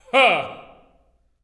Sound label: LAUGH, OP. LAUGHS; Spectrasonics; Symphony of Voices